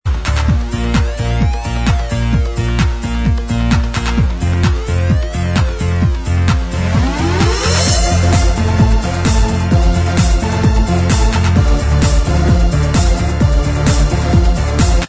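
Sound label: dance remix instrumental track, backing tracks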